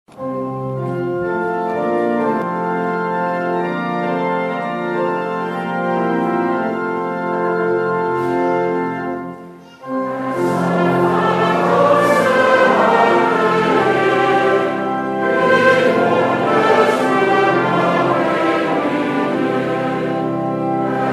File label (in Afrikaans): Gemeentesang; Ps 9-1 verse 1,7